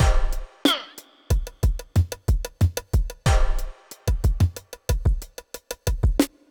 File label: deep beat 92